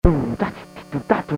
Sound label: loop original sample; Casio SK-1; Sampling